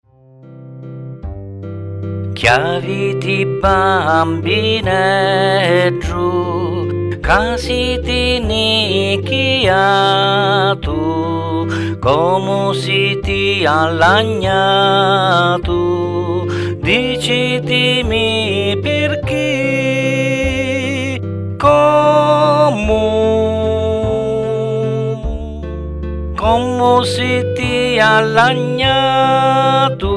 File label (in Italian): strumenti voce; ch aviti bammineddu; canzoni religiose